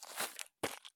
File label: turn gravel; Escape from Tarkov